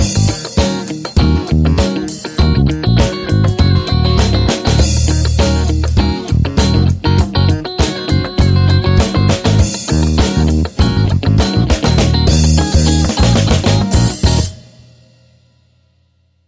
Groove

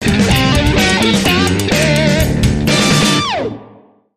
Righteous Funk, Sting

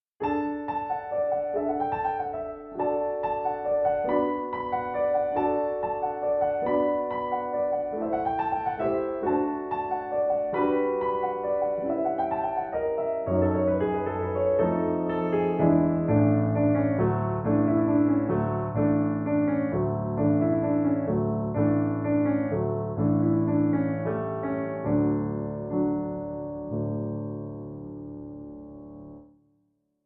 Norwegian Mountain Dance No 3; Edvard Grieg (PD)

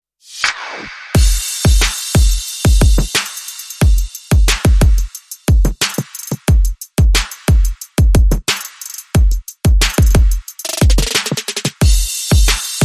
Victor Beat

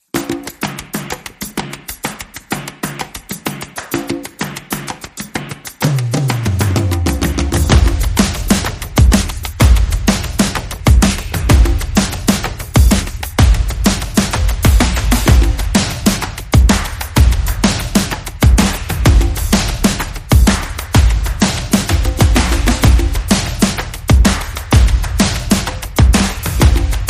Shuffle, No Snares Rolls

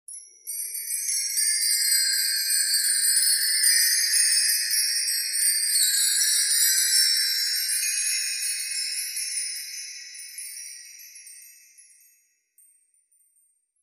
Long Chimes

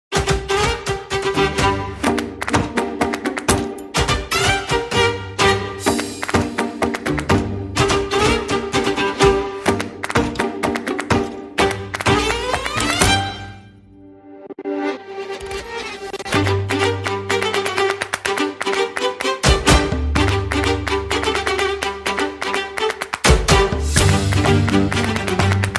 Light Percussion

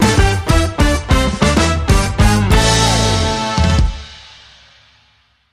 Funk

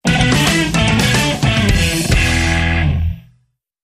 Rock

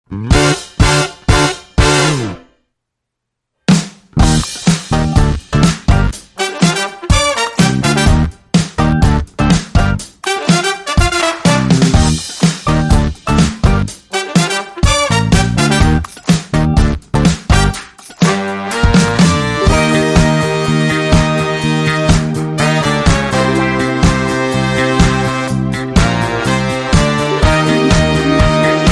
Push Up Brass, Basic Mix

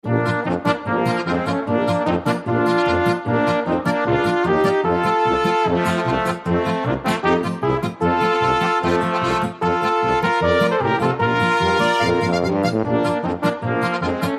German Polka

